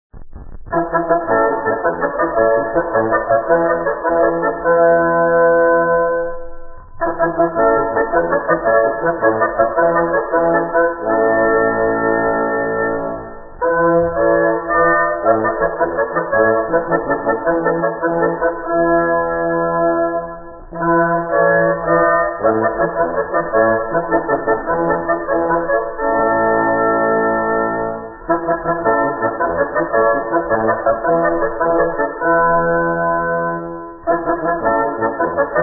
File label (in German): st. hubertus fanfare, jagdsignale